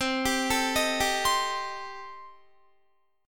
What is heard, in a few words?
C Minor 6th C Guitar; Cm6 arpeggiated; 20,22,19,20,19,19